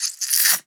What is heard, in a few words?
water movement wet squirts; a dump in the dark